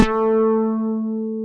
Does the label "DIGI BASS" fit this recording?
Yes